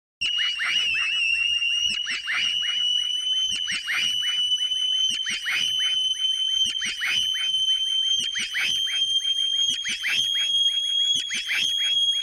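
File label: high-frequency radar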